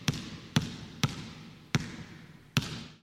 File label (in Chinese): 拍球; nba; 战中